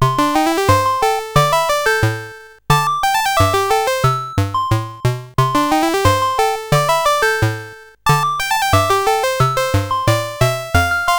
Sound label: sid CSG 8580R5; GeeBee Air Rally